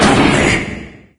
move stop; doom3; sabaoth

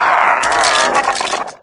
die, revanent; doom3

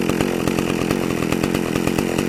chainsaw idle; doom3; sawyer

